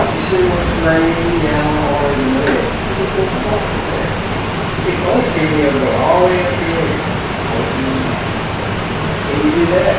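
Unexplained Glass clanging or spoon in coffee cup noise Amplified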